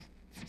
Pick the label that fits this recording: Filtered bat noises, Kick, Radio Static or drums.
Radio Static